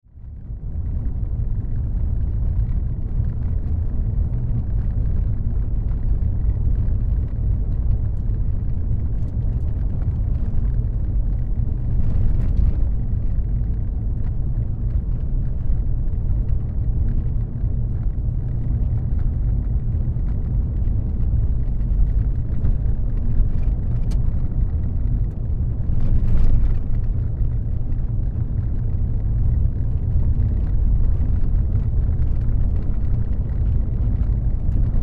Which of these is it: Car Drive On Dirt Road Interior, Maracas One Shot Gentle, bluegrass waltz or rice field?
Car Drive On Dirt Road Interior